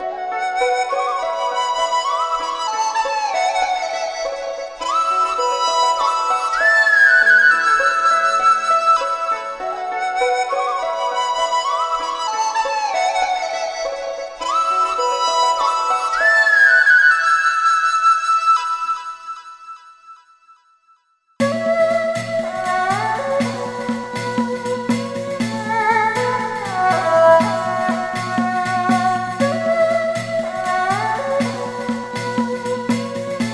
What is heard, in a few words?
Acid Rock Ethnic